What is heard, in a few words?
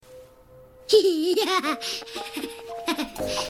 Lil Girl Laugh